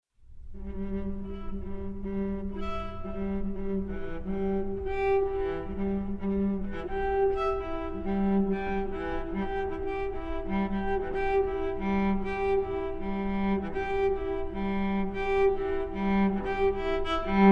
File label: classical complex